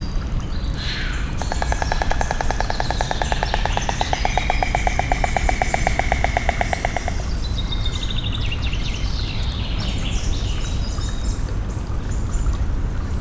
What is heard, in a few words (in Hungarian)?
reggel a tóparton, fehér gólya; szegedi zoo 2011